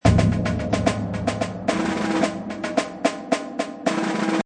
Orch Snare, Orchestral snare loop 110 bpm.; Drum Loops

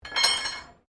Blacksmith Equipment, Colonial era objects; Machinery; Machines

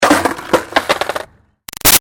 Skateboard Drop Crash Bounce; Skateboarding; Sports; BigRoomSound

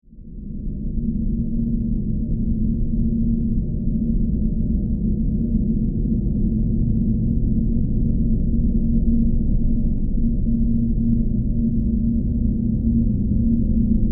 Drone Eerie, Space, Howl, Deep; Horror; Scary Soundscape Sound Effects; Blast Wave

